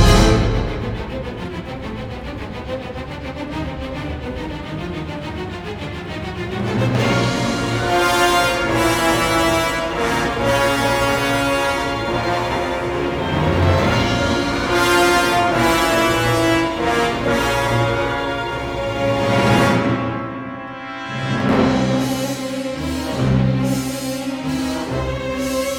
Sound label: action; lego star wars the force awakens 2016 ps4